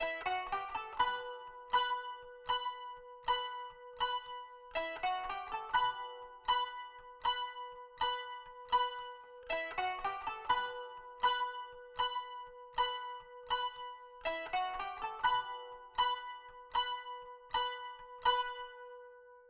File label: kanun